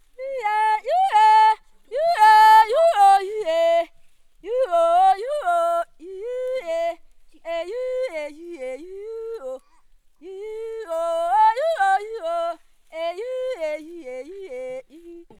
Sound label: woman yodelling lullaby